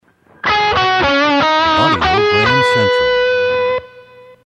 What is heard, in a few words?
Desert Wind, radio jingles